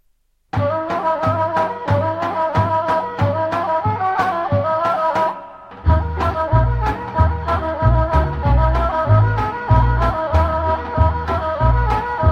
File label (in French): Bulgarie; Trite Pati